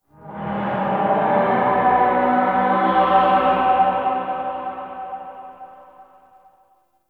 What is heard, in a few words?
Town Distant Horn Alarm; Ambience; Gameplay; Duviri